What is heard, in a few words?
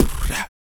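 vox percussion, Vocal Planet Jazz FX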